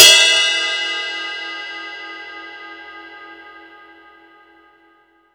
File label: Drum Dry; East Collexion; Drum 1